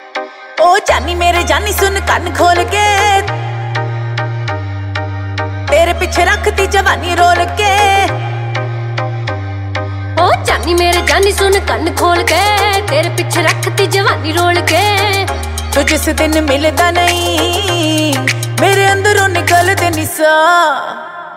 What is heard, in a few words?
bollywood new ringtone